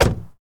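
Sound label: Truck Cherokee Door Close; SFX Kit; Trucks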